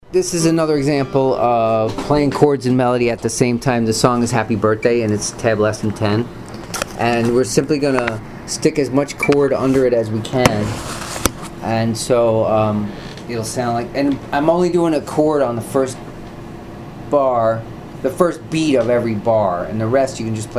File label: playing chords and melody at same time happy birthday, Guitar